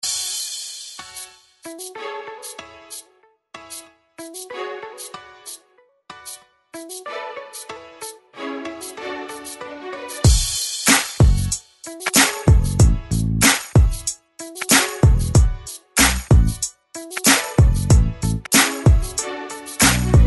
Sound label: rhythm blues